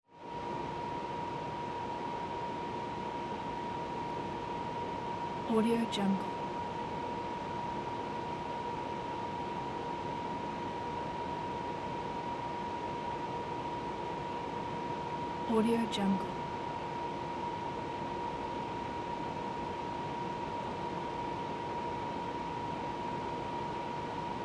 air purifier high fan speed

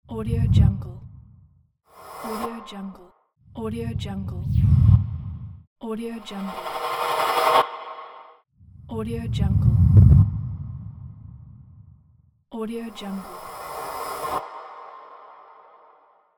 woosh; cat track

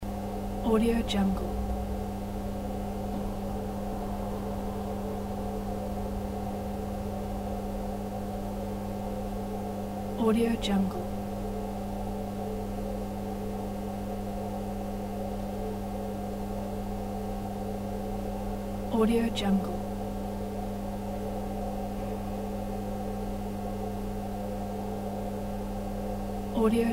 roomtone ambience with lamp

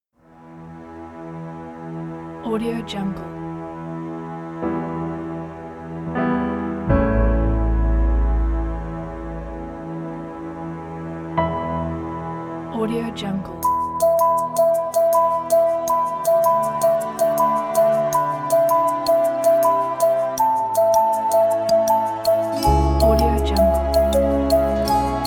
africa cinematic

